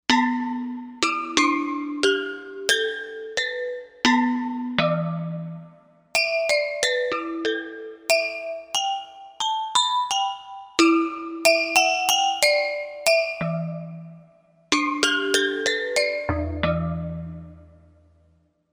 Gamelan, Korg M1Rex; Factory Patches

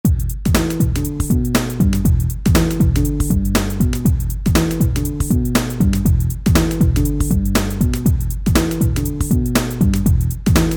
TripHop

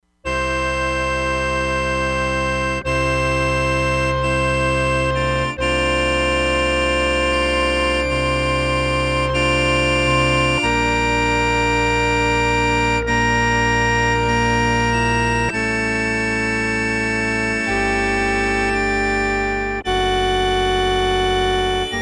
PIPE ORGAN, Crumar Bit 99; Factory Programs

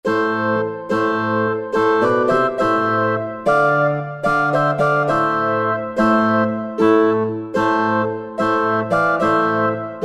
Madrigal

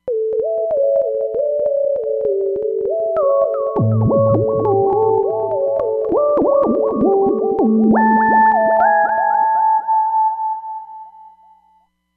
Sinetific, Roland Fantom XR

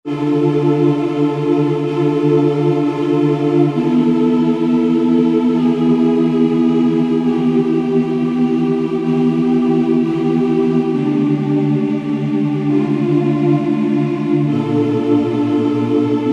Mmmms, Roland SR-JV80-09 Session

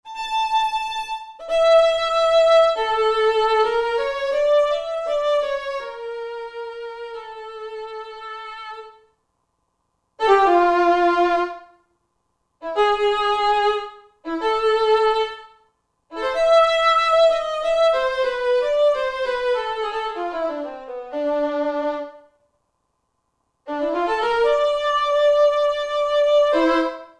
Vienna Solo, Roland SR-JV80-16 Orchestral II

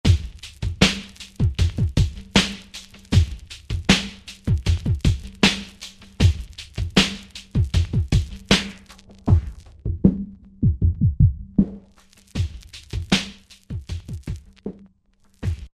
78, Hip Hop; Bacon; Roland SR-JV80-12 Hip Hop Collection

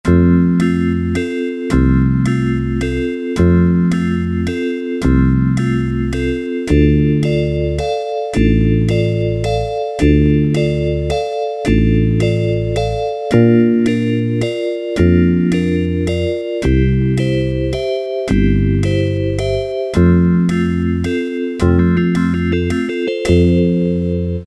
MARCH, Yamaha SHS-10 Factory style demos

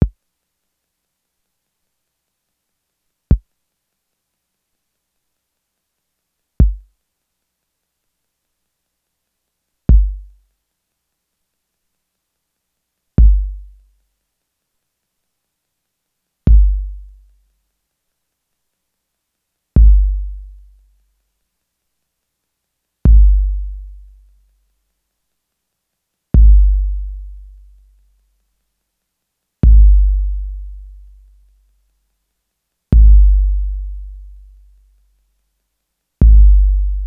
TR-808 Bass Drum; Roland; Instrument samples

